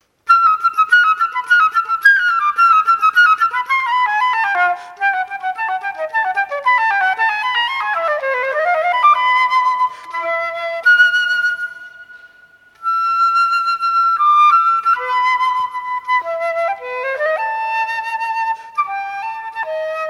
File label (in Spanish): Misterios de la llanura flauta